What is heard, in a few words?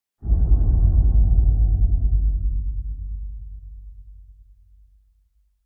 Impact Dull Sub Metal Hit (Deep Distant Bell); Hollywood Edge; sound designer's toolkit v2